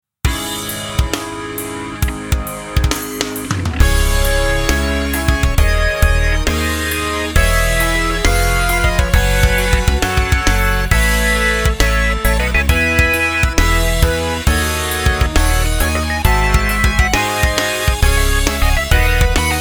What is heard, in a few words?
sad end